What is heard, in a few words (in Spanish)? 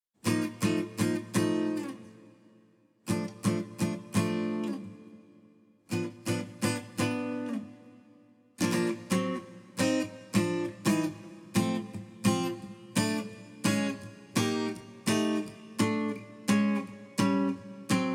guitarra acustica